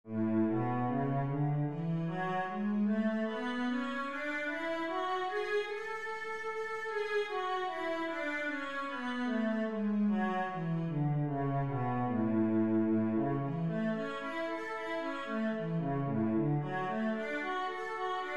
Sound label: a major, cello